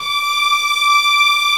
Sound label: CELL G4, VIOLINS; AKAI S6000 CD-ROM - Volume 1; Strings